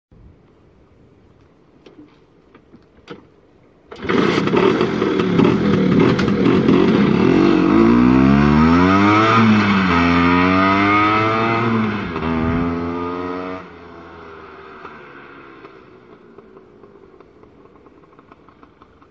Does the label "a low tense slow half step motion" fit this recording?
No